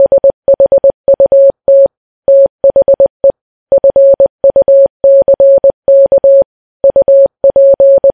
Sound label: funk